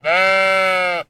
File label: Sheep, sheep bleat outdoors; SyrinScape; Waterdeep